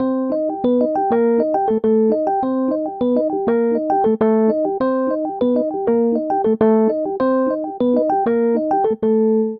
Ostinato Bass Latin Cuban C Minor 100 bpm, Electric Keyboards; MIDI Clips; Ableton; Factory Packs